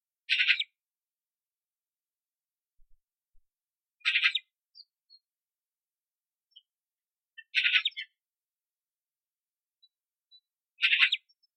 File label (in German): Elster